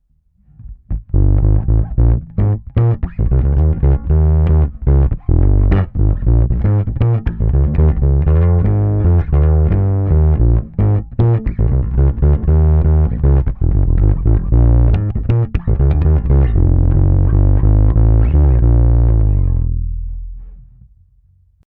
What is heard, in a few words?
P Bass Deep Dist; Glowplug